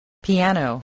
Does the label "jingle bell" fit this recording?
No